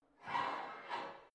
barbell heavy plate pull out quick reverby, fitnessstudio